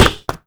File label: car tire bounce; Call of Duty Modern Warfare; physics